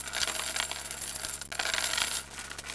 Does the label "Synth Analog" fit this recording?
No